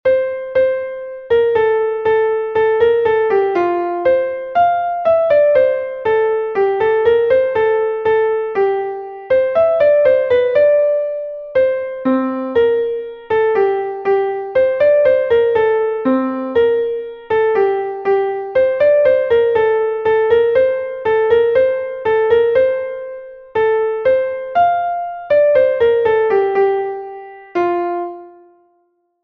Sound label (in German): klavier; geh aus mein herz und suche freud; melodiemeister; Frühlingslied „Geh aus, mein Herz, und suche Freud“